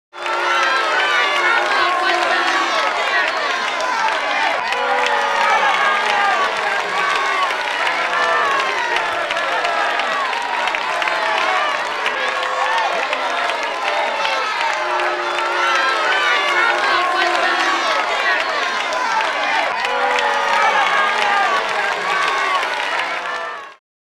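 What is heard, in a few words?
medium crowd applause; Asian Show Sound Effects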